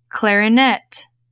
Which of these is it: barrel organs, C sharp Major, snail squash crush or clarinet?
clarinet